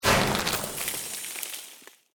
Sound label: Created Magic Spell Ice Bolt